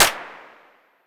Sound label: vermona drm1 mk3 clap